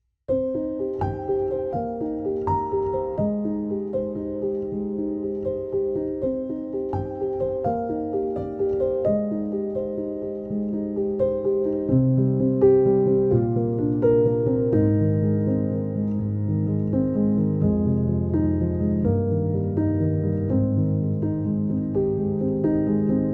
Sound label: Steinway Felt Improvisation; Pianoteq